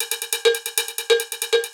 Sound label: Bell Rolling, Percussion Loops; Drums